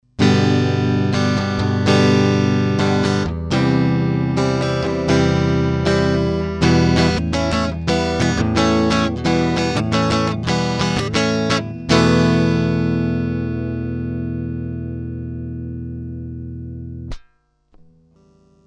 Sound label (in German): Gitarre DI; GAP Pre 73 DLX Premier; Gain 25 HP 0